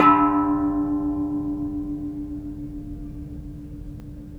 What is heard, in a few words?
chime